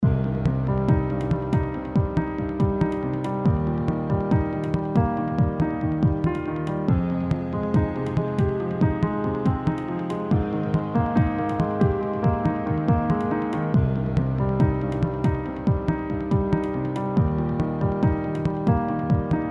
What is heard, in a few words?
minimal riff